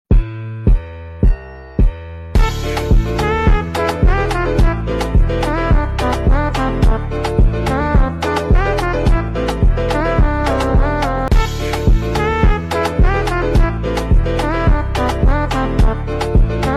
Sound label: rat dance long